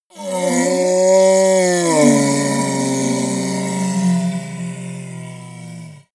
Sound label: monster dark growl hoarse; kreaturen